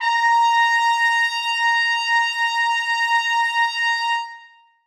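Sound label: trumpets sustain a#5; Sonatina Symphonic Orchestra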